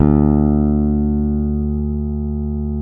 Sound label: P BASS SUSTN, D2; Sampleheads; Will Lee Bass Library